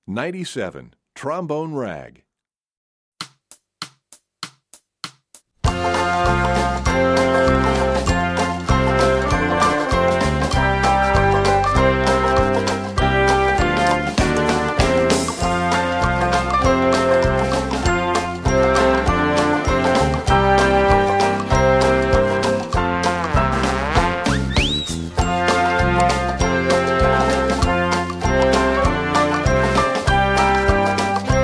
Trombone Rag; EE2000 Book1

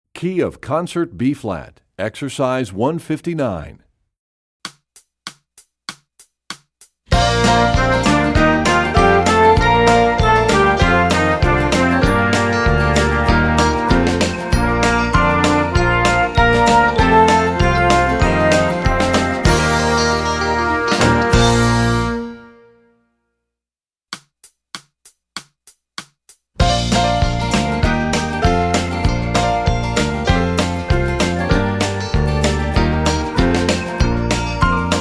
Studies Concert Bb; EE2000 Book2; Rubank

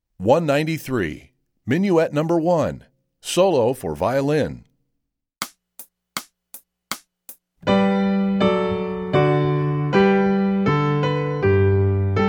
Minuet Solo Violin; EE2000 Book1

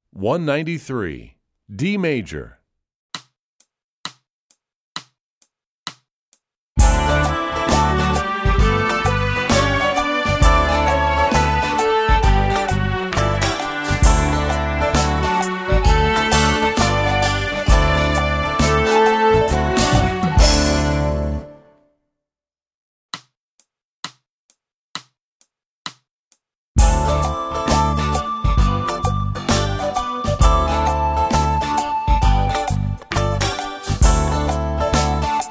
D Major, strings; EE2000 Book2

